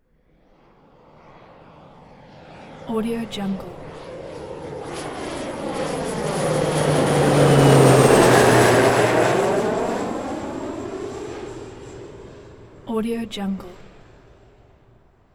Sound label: jet pass by; blast drive 30; prosoundfx